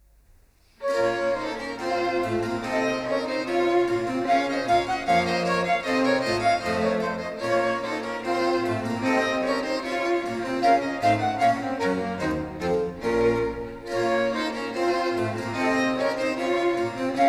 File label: Orchestral Suite in B minor Rondeau; BWV 1067; J.S. Bach; 4 Orchestral Suites